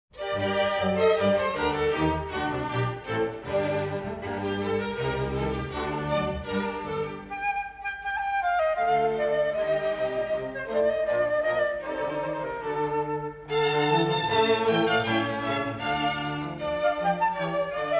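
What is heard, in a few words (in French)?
Concerto pour flûte et orchestre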